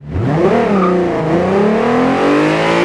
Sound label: first, vehicles; gmod; tdmcars; golf r32